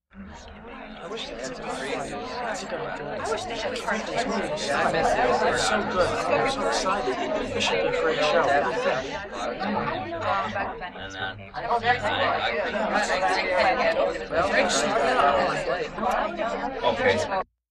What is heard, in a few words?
Party Chatter Quiet